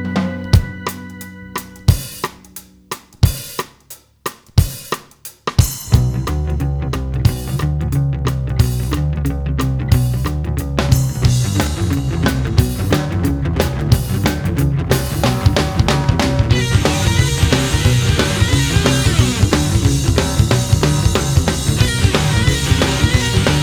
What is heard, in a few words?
Crazy Riff